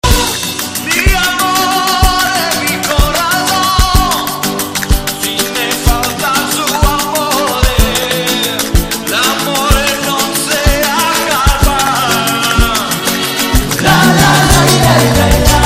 italian tone; dhoom 2

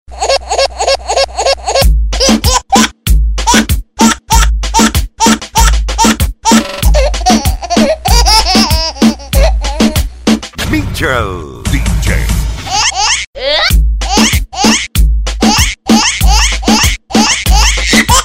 child laugh remix

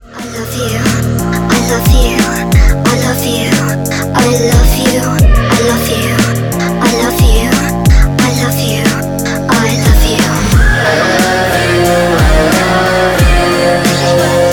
XYLO

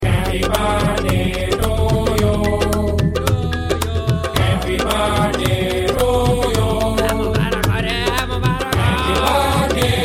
happy birthday to you

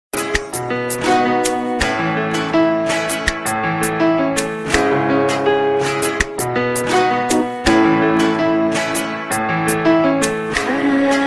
soft tone

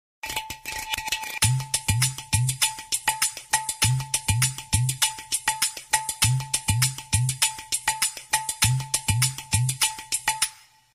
tabla music

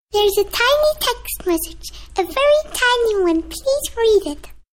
baby sms tone

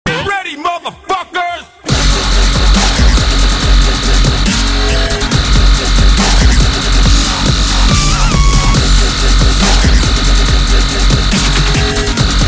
dubstep

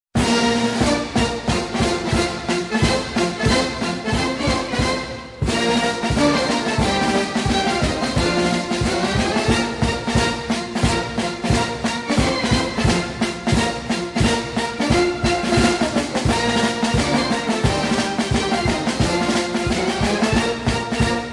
military fanfare

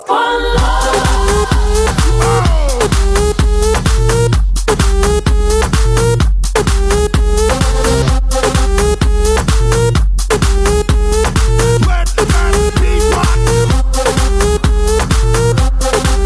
electro

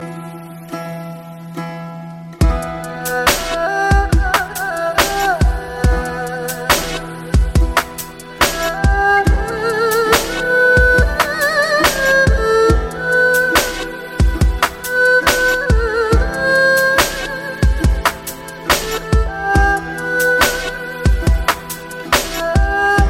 japanese melody

